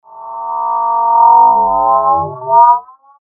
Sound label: interference sweep